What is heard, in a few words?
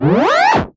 Scratching FX